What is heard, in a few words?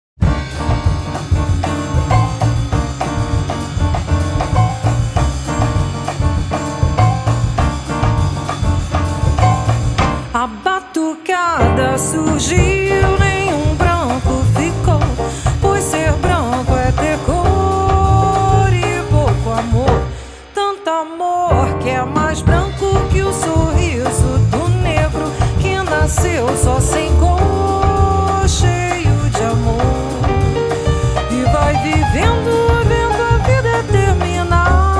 batucada